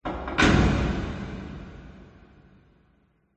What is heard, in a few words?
industrial door metal close very large reverb; zapsplat